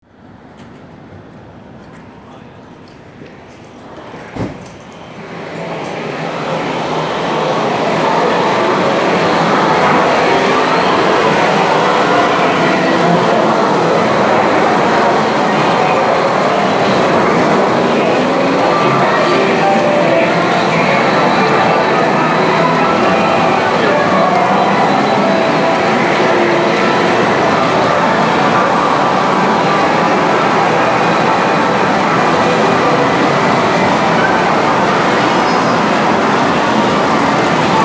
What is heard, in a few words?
japanese casino